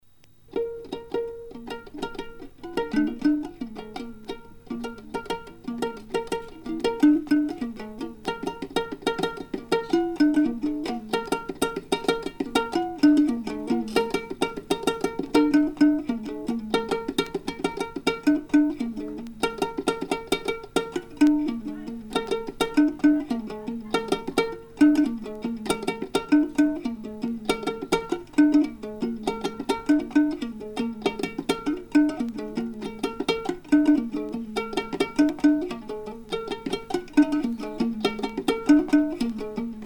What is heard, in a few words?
tibesti keleli; tchad